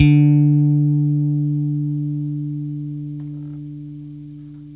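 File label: Stratocaster, Guitar; Quantum Leap - Guitar & Bass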